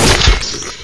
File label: launch, weapons; XDM